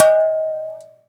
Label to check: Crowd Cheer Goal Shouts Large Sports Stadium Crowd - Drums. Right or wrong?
Wrong